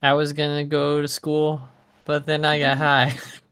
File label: school marsh